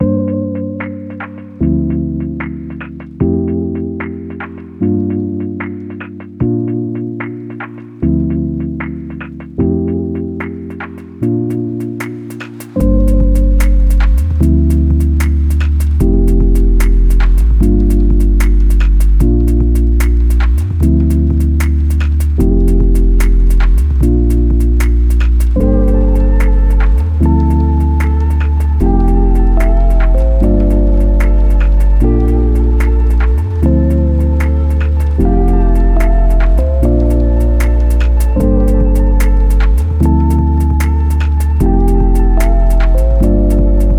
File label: chord pack